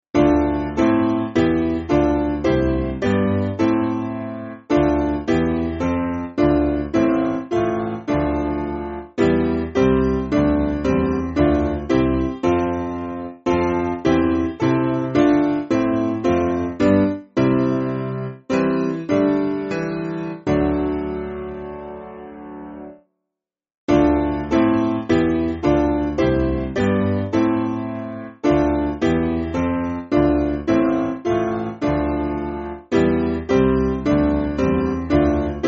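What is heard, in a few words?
Piano, Free Music Backing Files for Churches; Christ The Lord Is Risen; Christus Ist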